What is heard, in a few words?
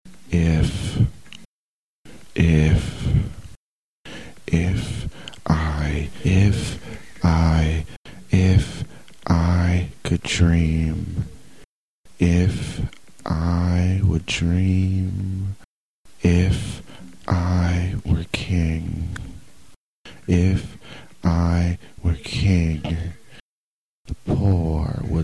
Vocal Bits; If